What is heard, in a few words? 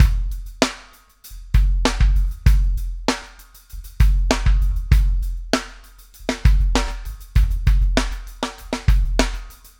SHRED, Backbeat